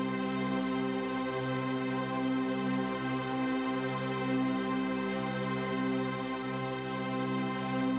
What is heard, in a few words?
Drone; stereo toolkit samples; Non Tempo Loops